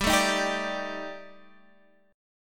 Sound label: Eb Major 7th Flat 5th